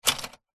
office typewriter single button key press typebar strike